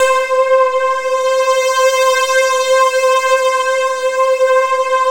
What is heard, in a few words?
SY99; DIGISYNTH; Best Service; Alpine Volksmusik